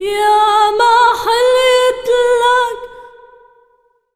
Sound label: SINGER A, Arabian Traditions; USB Soundscan vol.43; AKAI